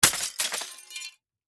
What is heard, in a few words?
Crash Glass Smash Crash Object; Crash Glass Smash Crash Picture Frame; Smashes; Sound Effects:Objects; Blastwave